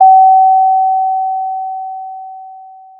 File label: Sound of a doorbell